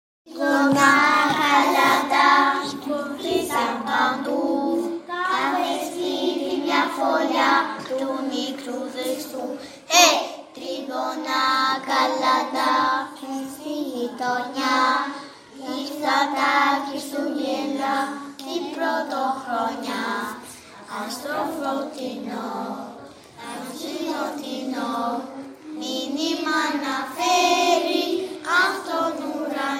Τρίγωνα Κάλαντα

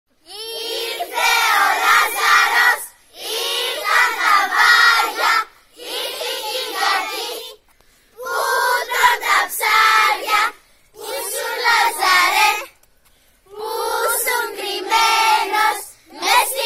ΚΑΛΑΝΤΑ ΛΑΖΑΡΟΥ

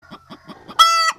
Κότα

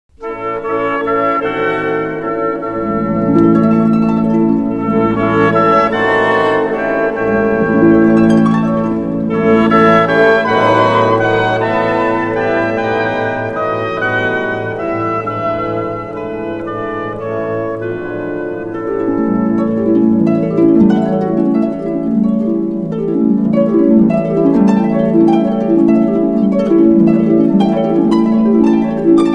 Άρπα Βάλς των λουλουδιών

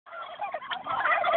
Γαλοπούλα

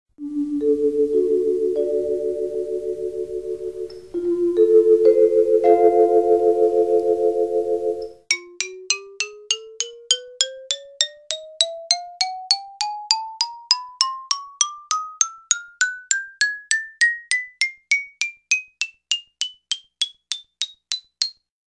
Μεταλλόφωνο και ξυλόφωνο

